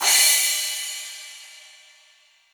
crash cymbal f sharp key

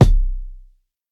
tight lows bass drum sound C key

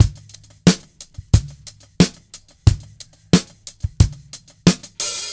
90 bpm drum beat G sharp key; drum loops

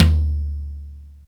acoustic tom drum d key

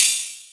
trap open hat one shot c sharp key

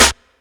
crisp snare single hit c sharp key; snare drum samples

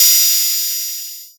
ambient ride single hit f sharp key

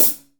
prominent high hat sound B key; Tuned drums

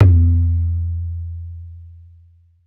low floor tom sound f key; tuned drums f key

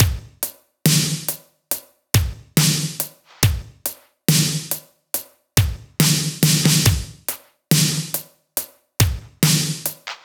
70 bpm drum beat f sharp key; Bitdepth 24

